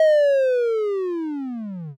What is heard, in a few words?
slowmo